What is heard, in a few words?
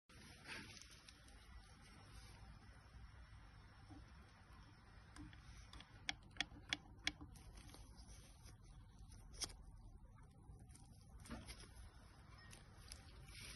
clutch lifter freeplay 600rr clutch lifter; cbr 600rr